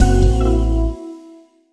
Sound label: alien error